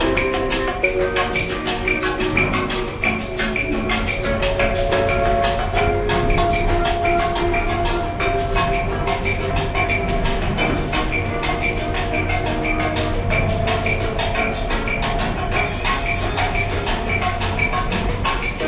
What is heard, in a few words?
elevator music; Apotheorasis